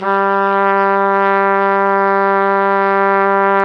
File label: Flugelhorn; Roland LCDP12 Solo Brass